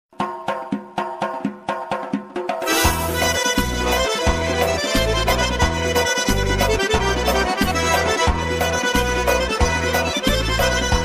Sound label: Caucasian; kafkas